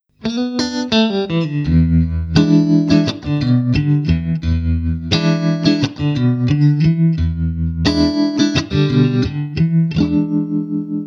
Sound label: clean tremolo